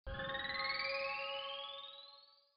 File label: space music